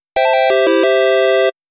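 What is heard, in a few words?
Fanfare; Namco Sound Museum from X68000; 100-man Ten Fanfaare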